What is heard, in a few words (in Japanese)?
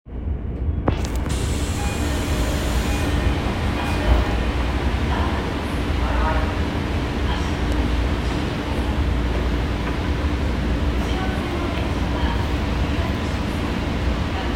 有楽町千線氷川台東, train sounds